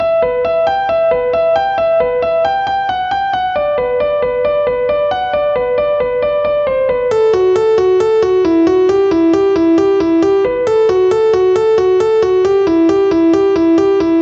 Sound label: Aggressive Piano Playing